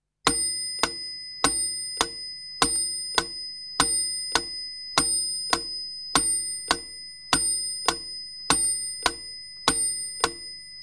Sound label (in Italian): OROLOGIO A PENDOLO